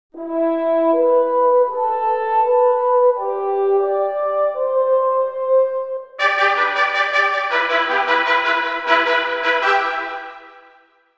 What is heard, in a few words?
Cornet Trumpet Fanfare; 11 secondes trompeter fanfare